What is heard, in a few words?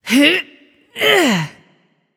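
grunt lift, female voice; smite; voicepack; hera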